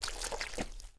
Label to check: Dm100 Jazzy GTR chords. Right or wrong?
Wrong